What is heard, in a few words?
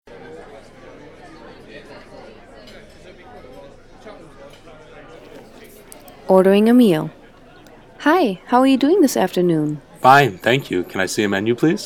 dialog bgm